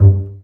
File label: BA PIZZ G1, Orchestra; Best Service; Peter Siedlaczek's Advanced Orchestra